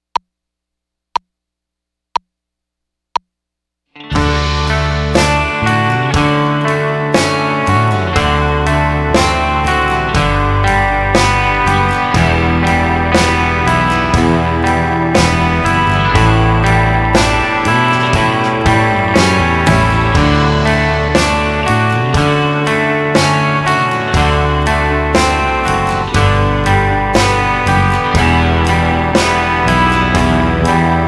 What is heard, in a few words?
Arpeggiation